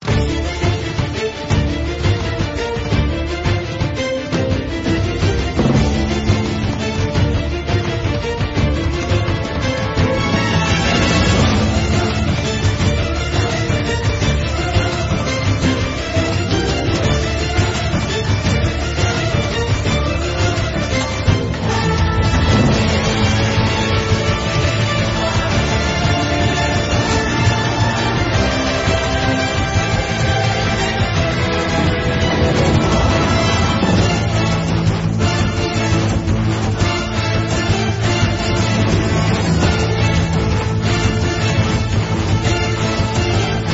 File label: Battle BGM; Localdia Chronicle; Android game music